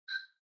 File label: mouseover